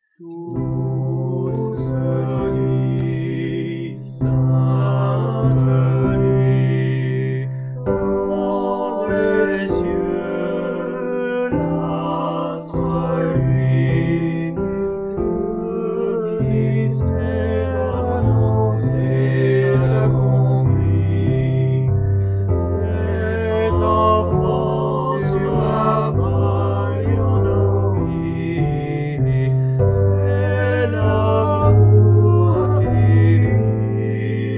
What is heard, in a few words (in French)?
Douce Nuit Vocal